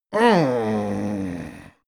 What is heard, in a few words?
male grumble strain release; menschen; koerpergeraeusche; soundpool